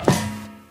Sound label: metal grate, footsteps